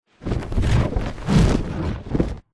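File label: flyer perched take off medium distance; boneyard; Titanfall 2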